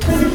Growl

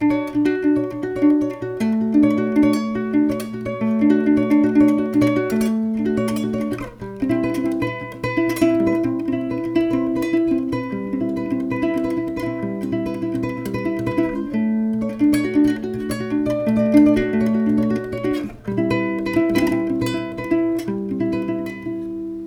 twinkly guitar

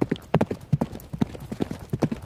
horses

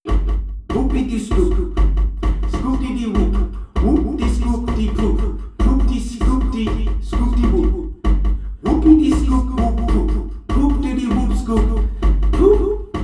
Timp with Echo

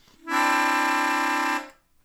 IR Melodica

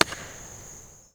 lake IR

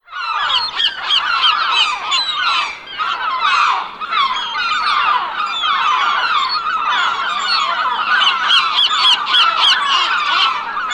Seagulls

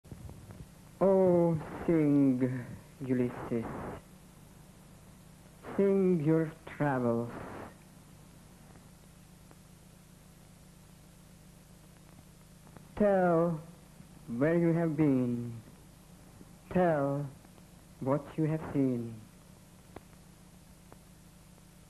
music

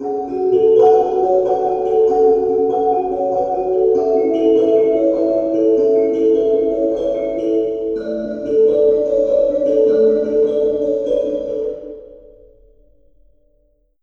kalimba

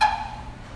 IR speaker Pop

